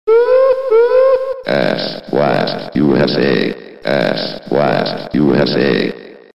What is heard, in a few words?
Blast; USA